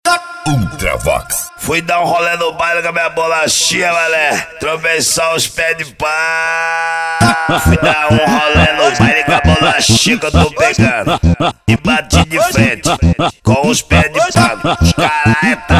funk

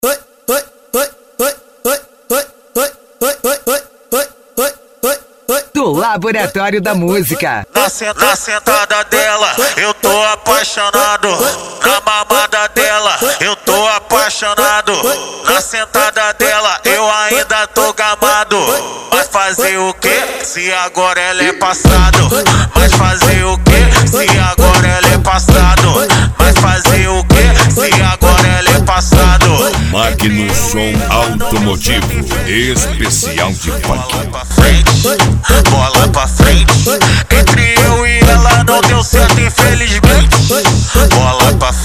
funk

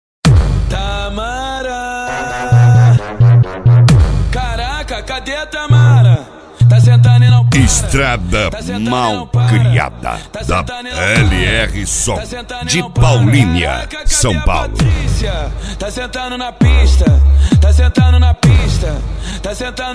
funk bass

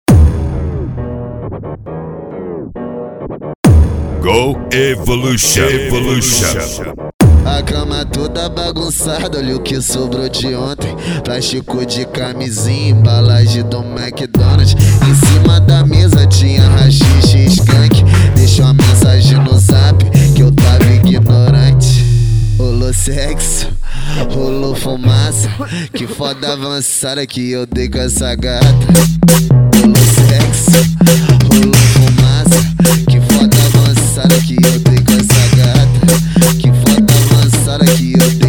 funk tumdumdum funk bass

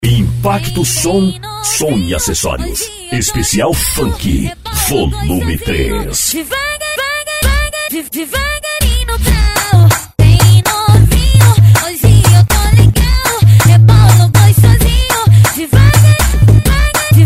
funk bass; impacto som

